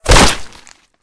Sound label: flesh; combat